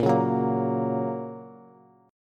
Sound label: Bb Minor Sharp 5th, Bass; strummed; chord shape